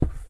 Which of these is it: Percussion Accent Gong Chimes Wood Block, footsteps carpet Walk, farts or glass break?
footsteps carpet Walk